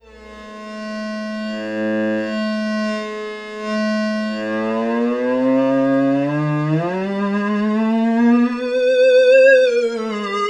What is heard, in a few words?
cello octave; theremin